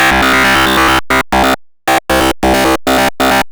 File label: GRUNGE